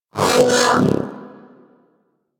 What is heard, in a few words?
Vocal Alert; Warframe; Pets; Zanuka; Zanuka Companion A